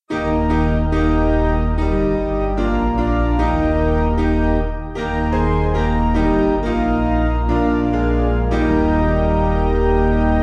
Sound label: Piano